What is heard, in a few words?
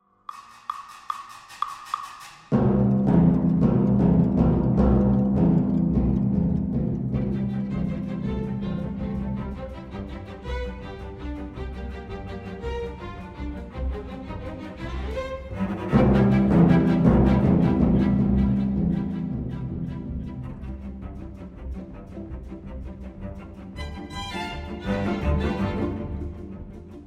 piano